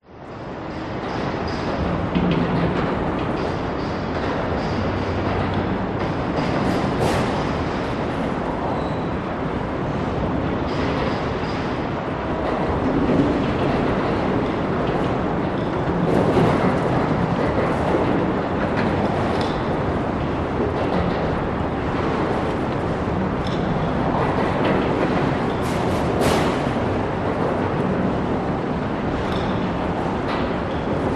public places city traffic over harbour bridge recorded underneath bangs; sydney; rattles